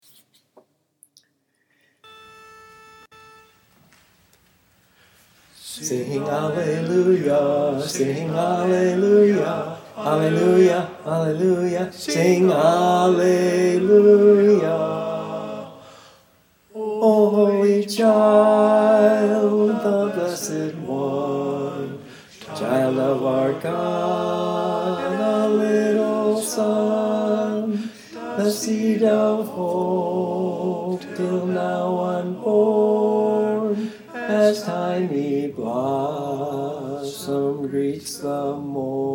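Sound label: Baritone, Learning Tracks; O Holy Child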